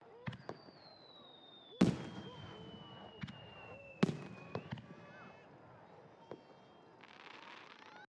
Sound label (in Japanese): 室内から聞こえる花火の音